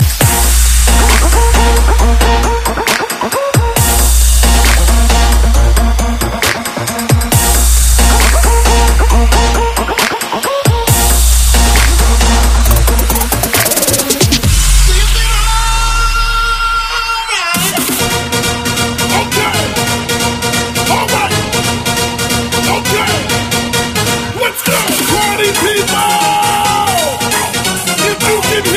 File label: Trap Remix